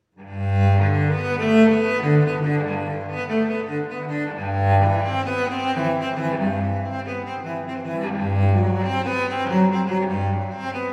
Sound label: Suite in G Major, Prélude; Suite No. 1; BWV 1007